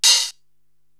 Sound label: HH Old Crisp Open, Korg TR-Rack drum machine